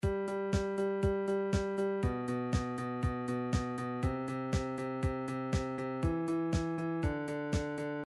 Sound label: midi drums new wave